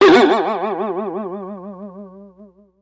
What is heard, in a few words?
Boing Box Vibrato